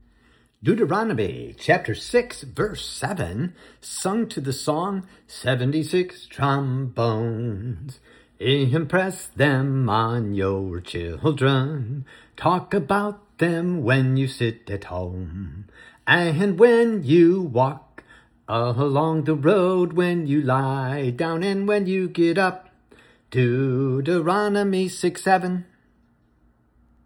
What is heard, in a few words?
trombones; Deuteronomy 6 7